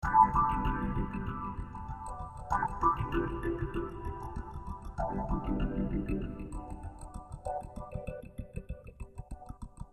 Swinglefunk C

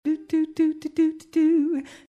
Jazzy 100-120

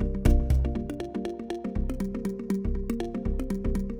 Mallimban C7 120

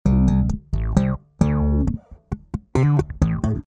D 133 Funk

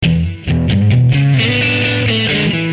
E 90 rhythm blues

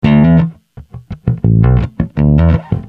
Bass Slap E 084

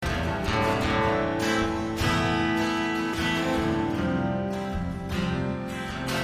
Strummed Am,F 77

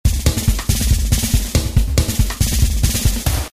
Crankin 140

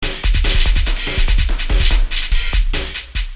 DnB Variations

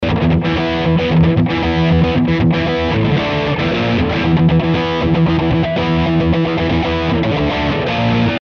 Verse Art Rock E

